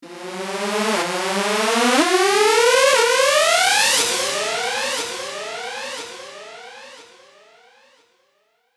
riser barwise siren sweep

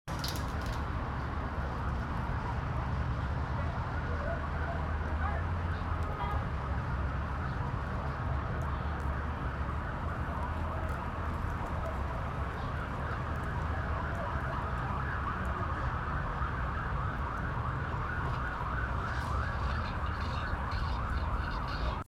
traffic sound in city

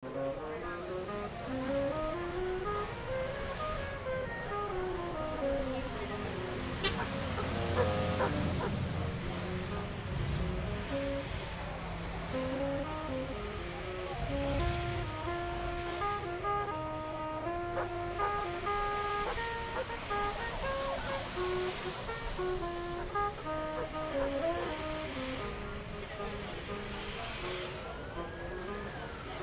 city night terrace with jazz musician

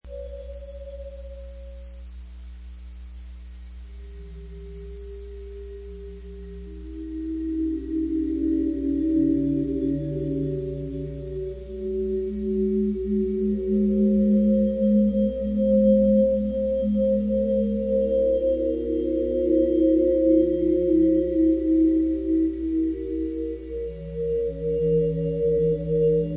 ambience; abyss

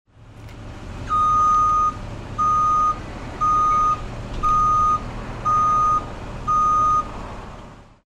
ambulance backup

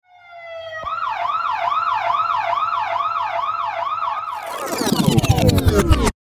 siren deck pause

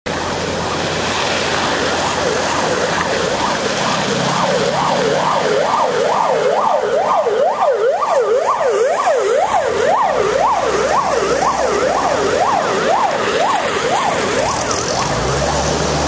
ambulance siren at a distance

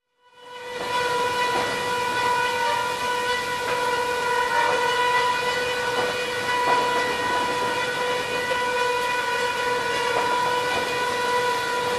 Warning Siren in Distance

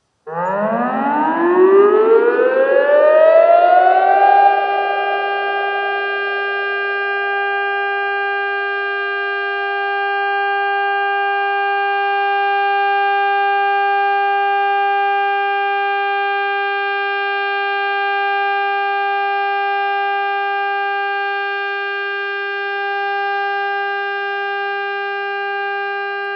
air raid siren alarm oneshot

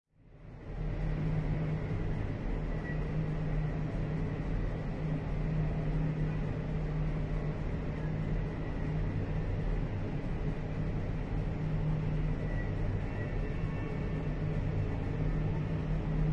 michigan ave from inside 24th floor windows

